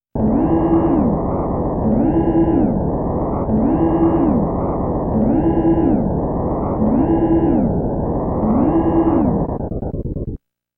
Alarm

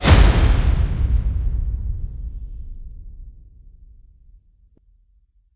Combat Transition

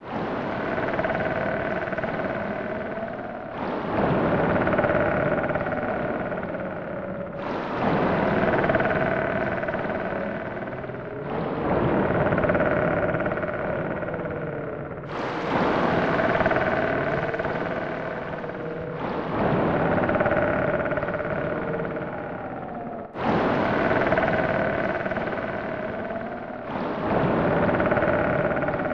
Ship Creaking; marvelous; snes

